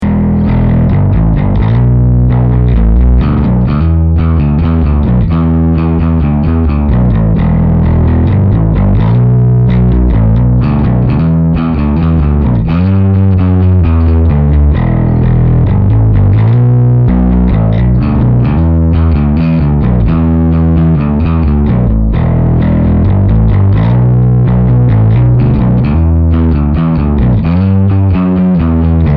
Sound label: 7 Plectrum Gain Full